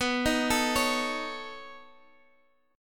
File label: B 9th B down Guitar Half Step Down